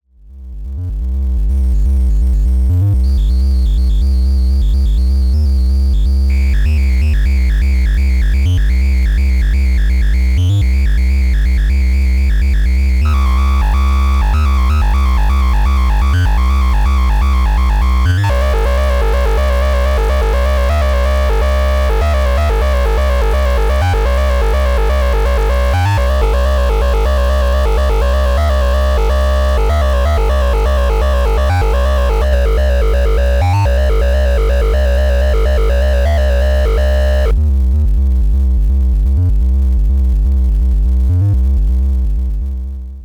Xaoc Devices Leibniz Binary Subsystem Tri Wave Bit Inversion